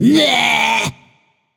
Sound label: tongue; danzaburou